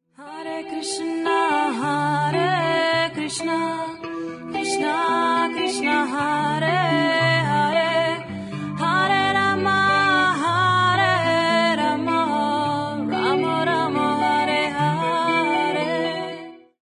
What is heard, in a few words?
Hare Krishna, Electro Punk